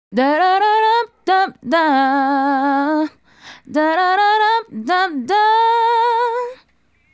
Female Solo, Hip-Hop and RnB Vocals

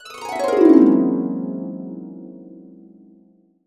Harps

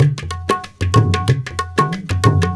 India, Heart of Asia

